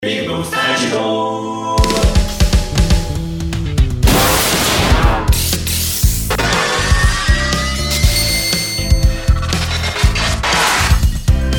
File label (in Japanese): バリア; ぴぐみょん効果音素材集; ぴぐみょんスタジオ